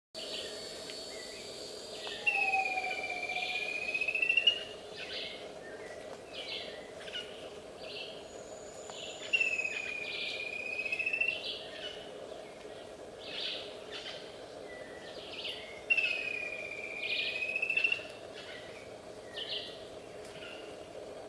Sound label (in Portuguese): Canto da galinha do mato